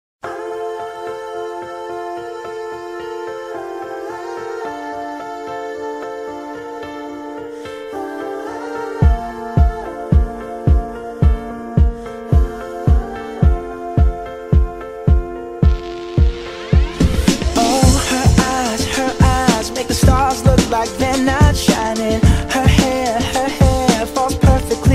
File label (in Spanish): musica